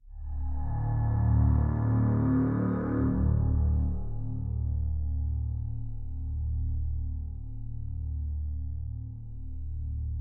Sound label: amb bed; A Sound Plan